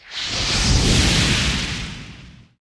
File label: spell archer vanish cast